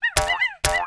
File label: Monkey Run